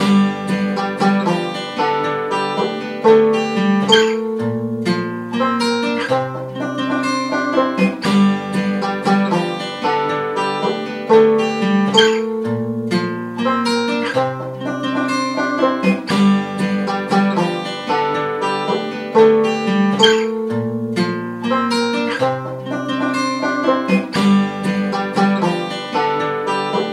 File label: banjo ditty